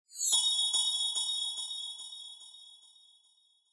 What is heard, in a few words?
Bell Accent Delay